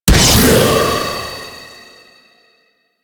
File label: Target Ability; Warframe; Animus; Anima Animus